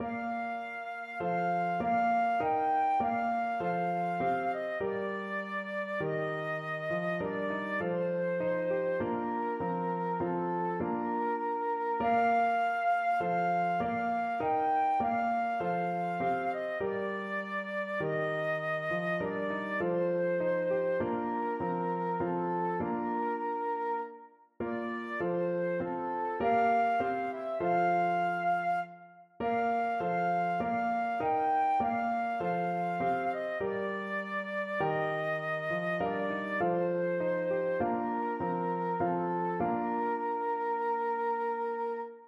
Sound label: es ist ein ros, flute